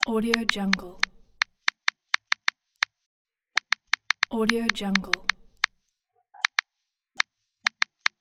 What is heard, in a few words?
phone typing text message